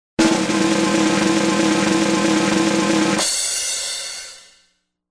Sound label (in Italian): Effetti Sonori - Rullo Di Tamburi